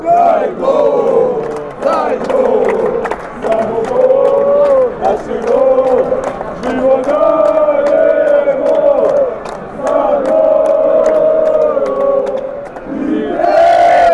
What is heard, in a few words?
chant, fanchants; montenegro